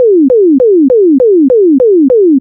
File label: alert; lightyears